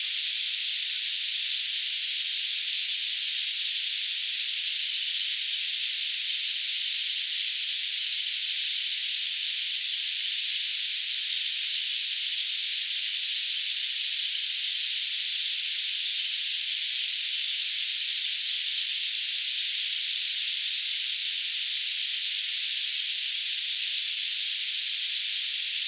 Troglodytes troglodytes

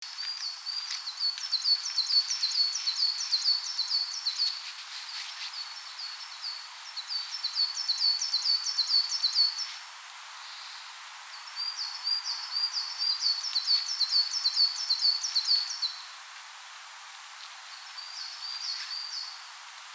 Parus ater

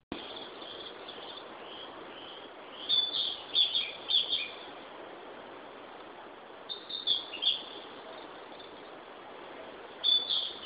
Ficedula narcissina

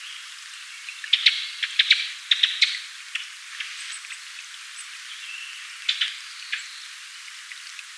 Turdus cardis

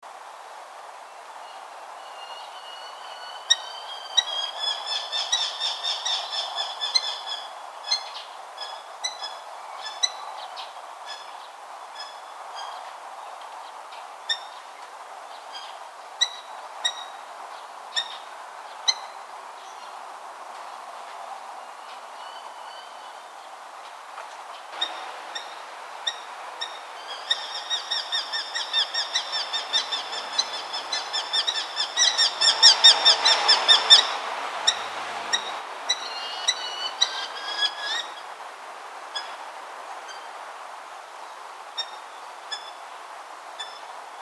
Falco subbuteo

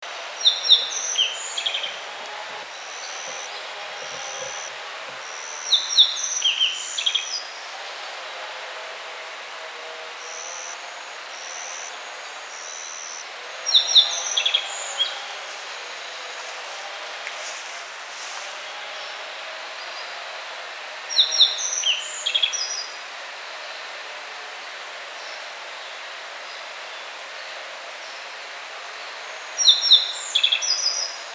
Emberiza sulphurata

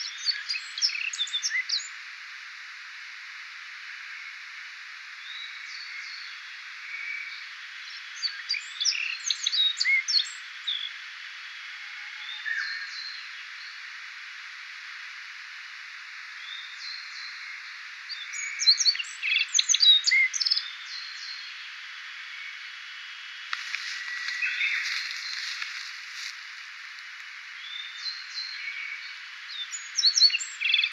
Emberiza sulphurata

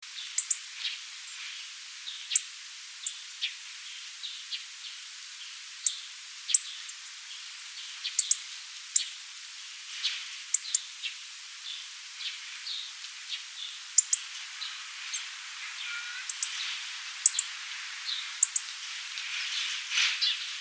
Coccothraustes coccothraustes

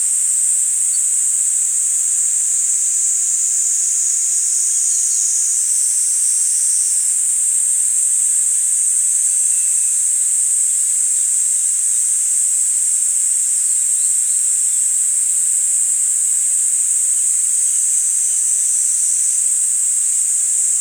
Platypleura kaempferi

